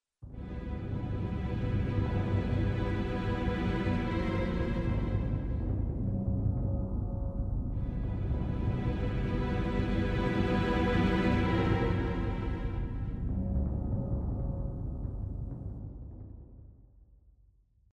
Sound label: melody ww octave backing wet